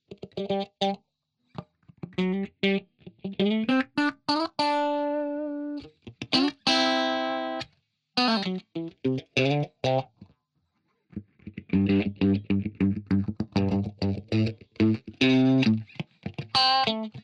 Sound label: Git dry; Presonus Studiolive ar22 usb